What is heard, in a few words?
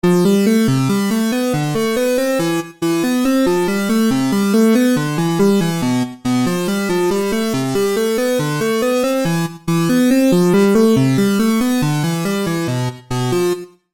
LEAD Fuzzy Darkness; Phasewaver; syntheway